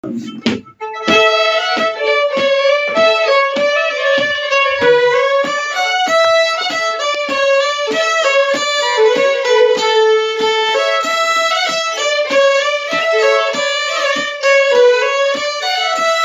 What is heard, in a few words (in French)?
Enchainement polkas